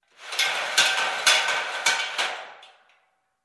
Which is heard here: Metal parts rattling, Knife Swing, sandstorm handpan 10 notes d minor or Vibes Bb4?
Metal parts rattling